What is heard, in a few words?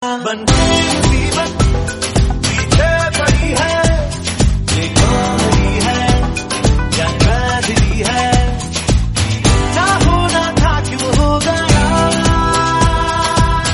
hindi super song